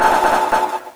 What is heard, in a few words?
minigun spin down; weapons